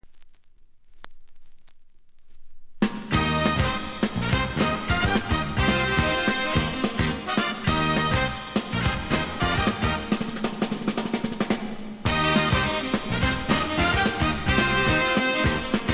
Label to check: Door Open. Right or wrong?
Wrong